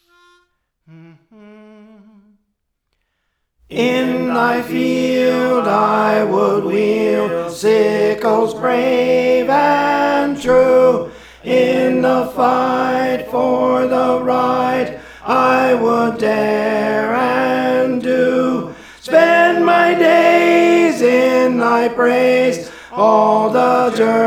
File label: Tenor; Praise For The Lord Training; Let Me Live Close to Thee